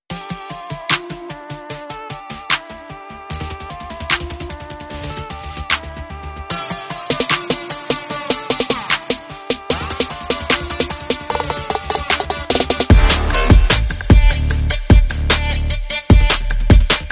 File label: Trap Full